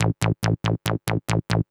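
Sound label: SYNTH BASS DANCE 140 A; eJay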